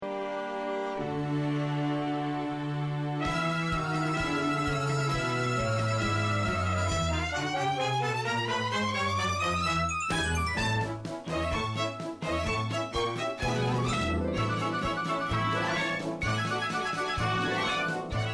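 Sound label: practice track soprano, alto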